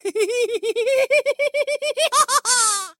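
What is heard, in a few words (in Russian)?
посмеивание тихое артиста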